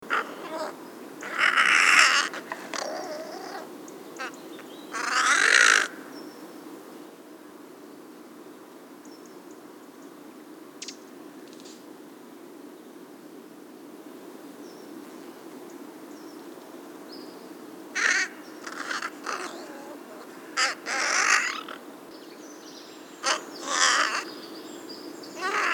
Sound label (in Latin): Corvus corone; SETE